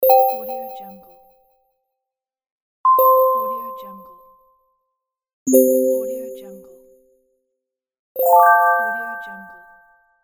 notify

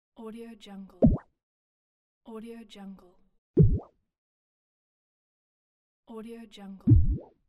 plop drop

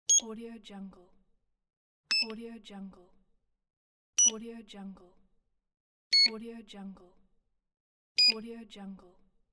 single beep button

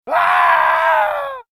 male death scream; hollywoodedge

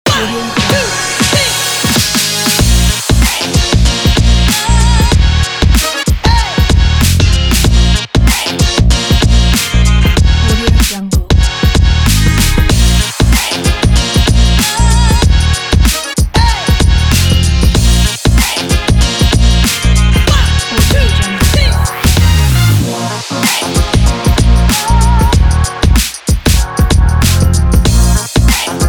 hips hops

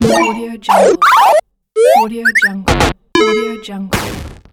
game sound

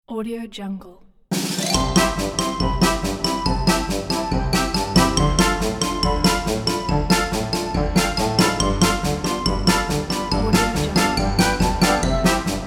cartoon circus

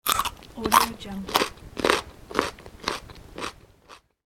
carrot biting and chewing

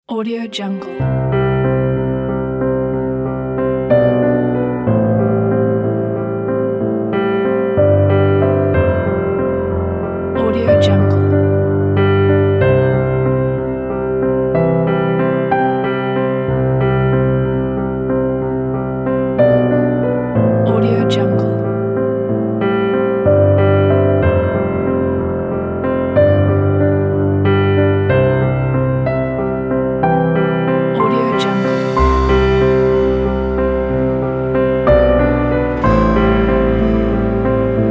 emotional piano

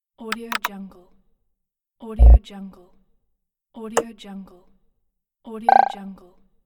perc alerts for apps

